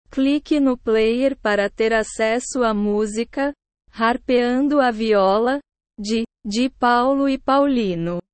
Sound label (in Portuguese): harpeando a viola